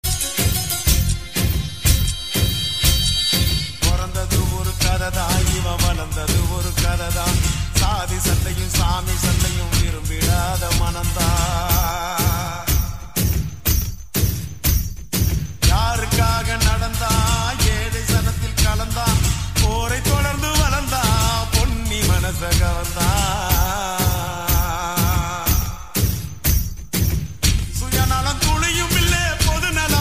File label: iranian songs